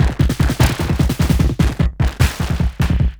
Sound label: Beat, Industrial